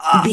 voice male grunt pain

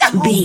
voice male hurt pain comedy

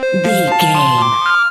collect item retro jingle

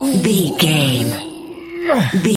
voice male effort push slow

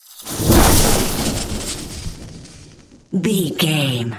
elec lightning magic spell

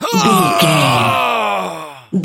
voice male death

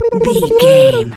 voice fun character flutter man

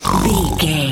pig sniff long

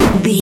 retro impact hit

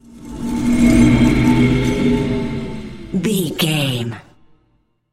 wind blizzard storm spell med

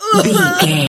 voice female hurt pain choke

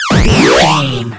retro explosion big

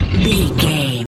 metal scrape deep grind squeak

